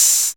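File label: 808 open hi hat; Goldbaby Tape808 & Tape909; The Tape 808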